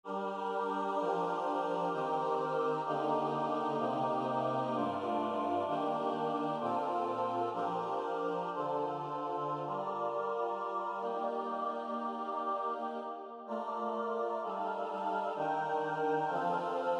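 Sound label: SATB, G minor; O Look At Me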